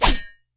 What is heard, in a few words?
blade